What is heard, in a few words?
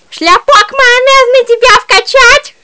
girls death